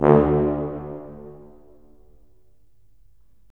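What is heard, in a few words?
brass tuba; LA Composer vol. 3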